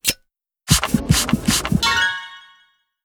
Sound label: recover; Animation Throwdown